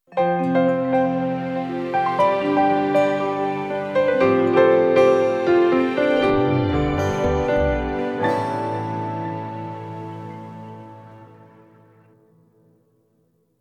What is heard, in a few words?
Relaxation Intro Logo; Lost Harmonies